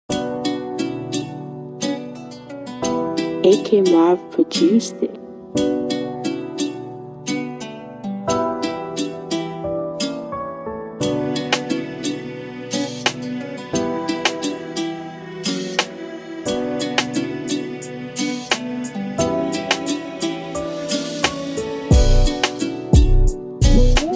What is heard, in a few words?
UK Rap Instrumental Type Beat